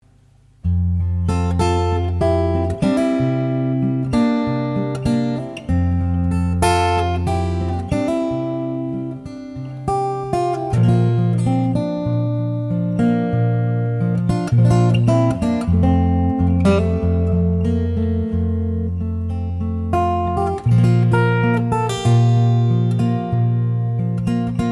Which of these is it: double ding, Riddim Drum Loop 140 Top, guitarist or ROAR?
guitarist